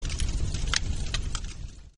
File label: meteor burn sound; Arc of Templar